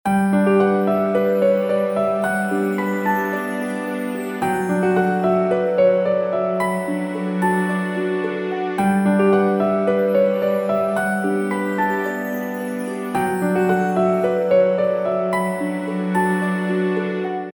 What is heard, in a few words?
Piano short Loop